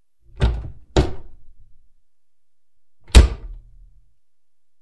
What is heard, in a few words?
locker; openspace3d; kidkitchen